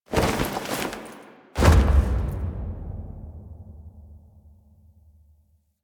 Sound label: Death Fall; Warframe; Quests; The New War; Drifter; Foley